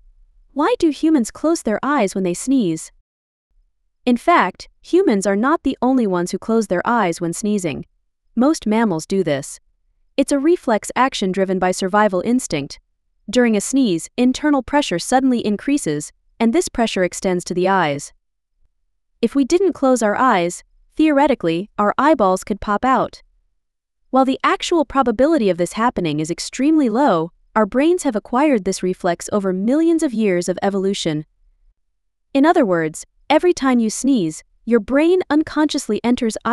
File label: sneeze